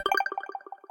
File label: chain